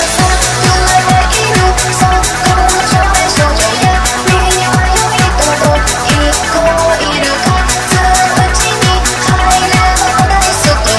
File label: Darkwave